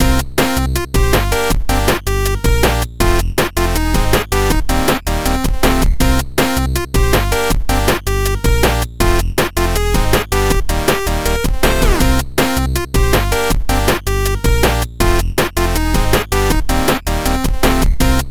rumble loop, music; hero-mark-2

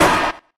mech hurt; revo-jailbreak

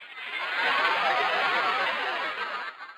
absorb shard; revo-jailbreak

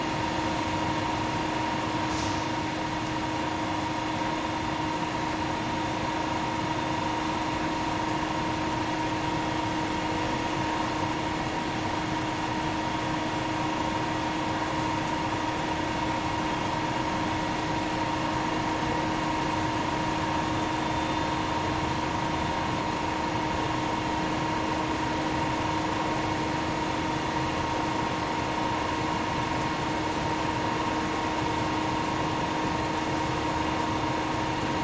amb; capri

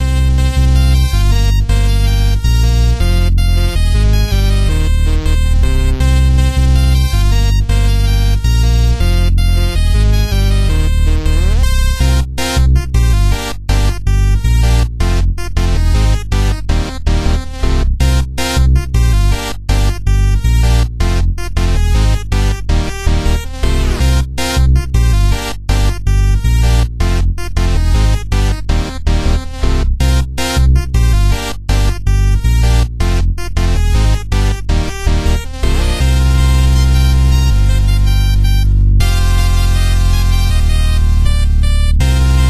intro, music; hero-mark-2

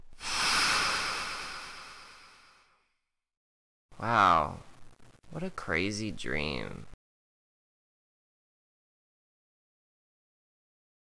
msx ending; hero-mark-2

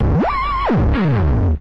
final score; revo-jailbreak

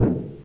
mech walk; hero-mark-2

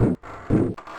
mech walk; hero-mark-2

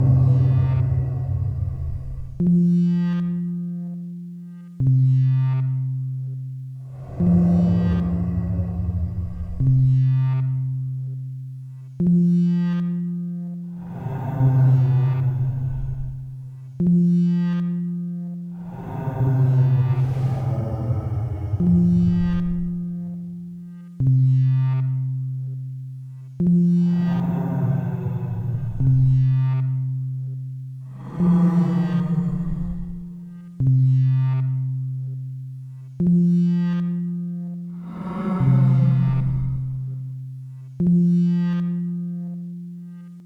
gooey, music; hero-mark-2

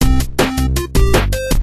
shard; hero-mark-2

